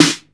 dry snare D key

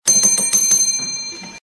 reception bell ringing

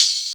subtle open high hat c sharp key

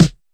smooth snare sound E key; Duration 0:01; Channels Mono

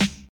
hip hop steel snare drum sample g key

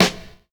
snare f sharp key

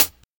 good high hat sample A sharp

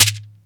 shaker sound G key; percussion

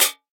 clean hat one shot A key; hi-hat

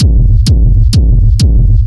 hard techno sharp kick; drum loops

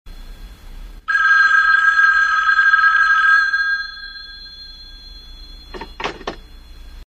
old telephone ringing and pick up

